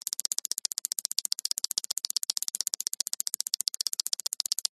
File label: cartoon insect scuttle fast